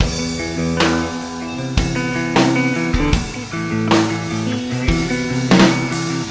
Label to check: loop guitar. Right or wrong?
Right